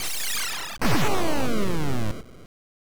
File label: Start; Gyruss; Famicom Disk System